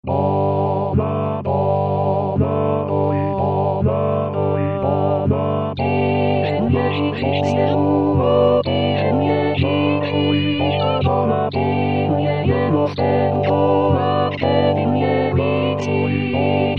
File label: Mazur